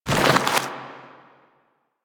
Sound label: Ground Spike Dissolve